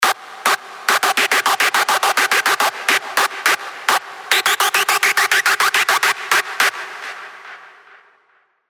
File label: screech; Revealed Serum 2 Screech Pathogen